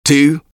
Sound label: taunt rps exert; taunts; tf2; engy